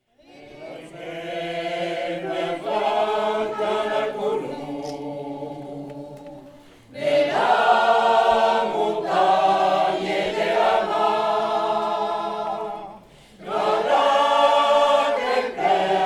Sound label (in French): chorale